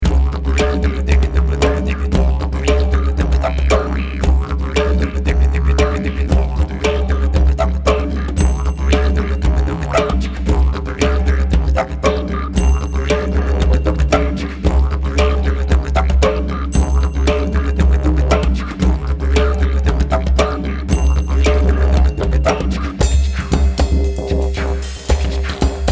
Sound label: Energetic Tribal Music